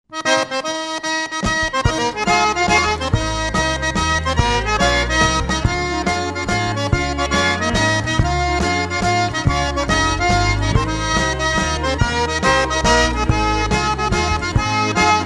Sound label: waltz